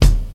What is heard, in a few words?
high quality rich top end kick drum sound d sharp key